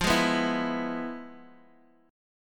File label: E Major F chord; E/F Chord {X X 3 1 0 0} strummed